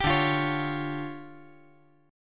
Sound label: D Minor Major 7th Double Flat 5th, down